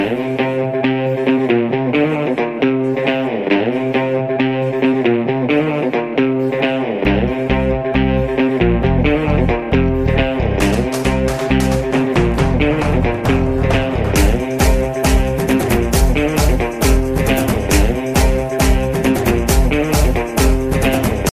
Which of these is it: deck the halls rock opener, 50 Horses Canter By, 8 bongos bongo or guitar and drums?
guitar and drums